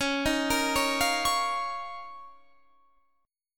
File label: Eb 7th Suspended 2nd Db